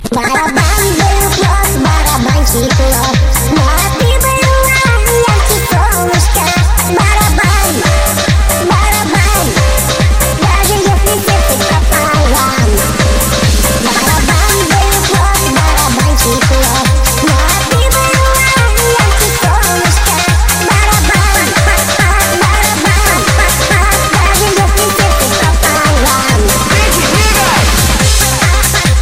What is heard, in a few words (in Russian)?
baraban